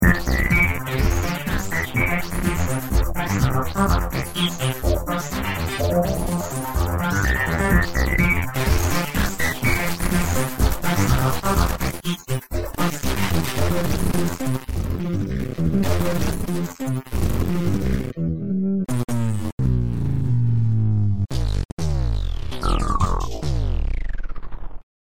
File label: interlude; Twinkle Elf